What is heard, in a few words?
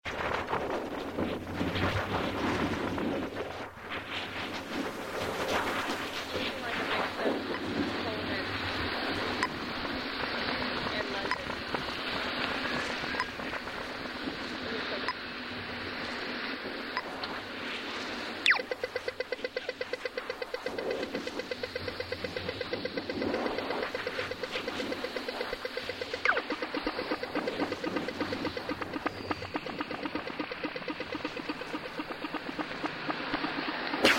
dublin